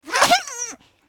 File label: Pigmies Hit